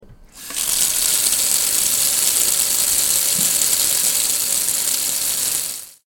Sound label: kitchen sink